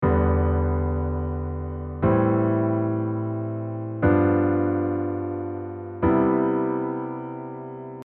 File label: chord cadence